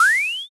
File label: vanish